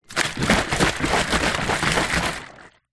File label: foley bottle water shake